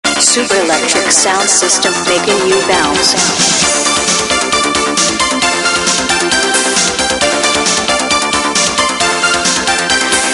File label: Bounce, ringtones